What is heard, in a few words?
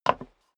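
step dress boots wood creak single stair down, footsteps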